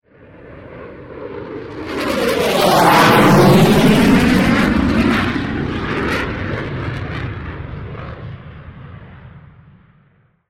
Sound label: rafale